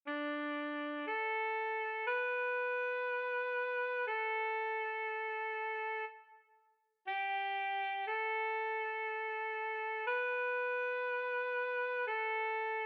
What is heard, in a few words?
Cantabili MIDI